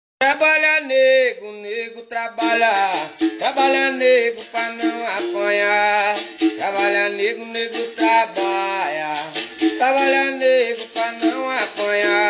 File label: African Local Song